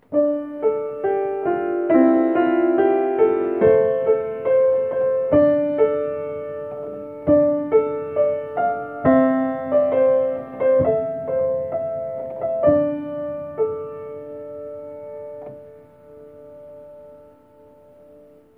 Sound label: motif